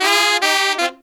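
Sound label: Pop & Funk Brass, 110 Fm, left; Best Service ProSamples vol.25; AKAI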